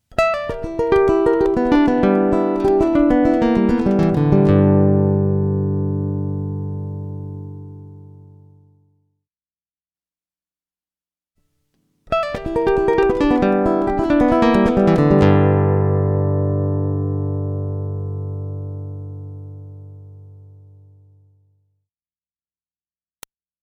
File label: Banjo Lick